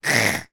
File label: human boy 3 years old british says ugghh disgust